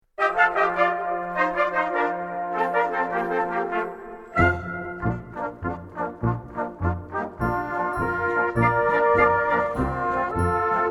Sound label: Grossvaeterchen Polka